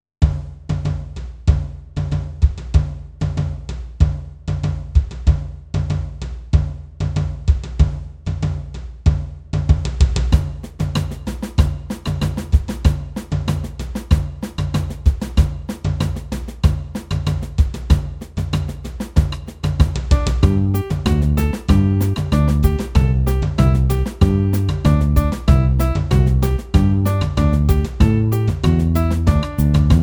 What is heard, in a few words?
Karaoke